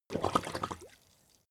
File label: swamp oneshot bubble